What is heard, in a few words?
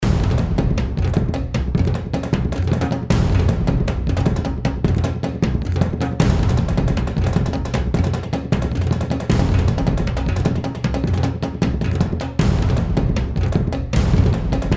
action drums action main